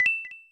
Coin Bound, Sound effect; NSMBW

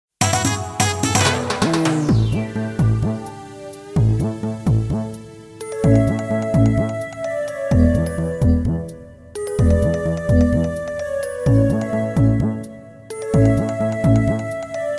Undersea; Beach Bowl Galaxy; Super Mario Galaxy

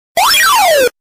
Miss; Donkey Kong Jr; Safe Buster

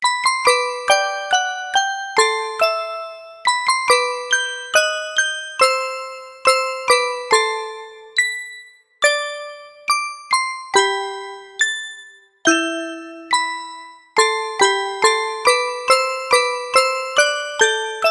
A Bittersweet Music Box

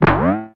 Jump; Bowser

